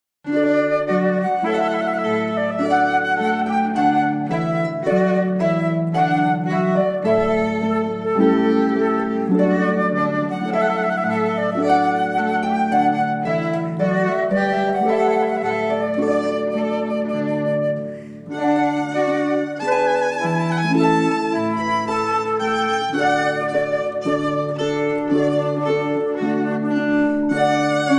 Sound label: Trumpet Voluntary, trios